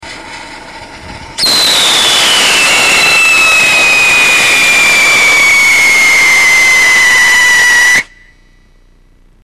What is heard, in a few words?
potassium dichromate, rockets, whistle tests; Sodium Benzoate; Core; Poisonous Mix - Use protection. Reduces speed of burn.